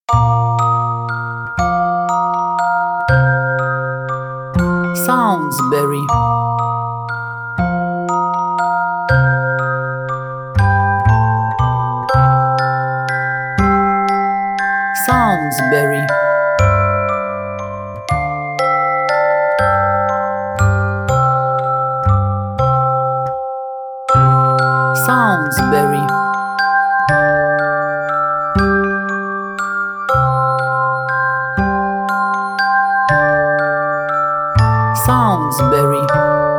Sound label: Magic music box